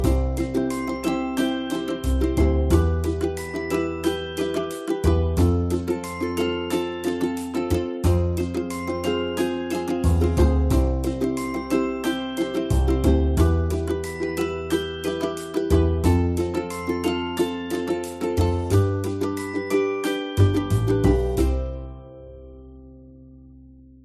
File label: Ukulele Song; Hold Music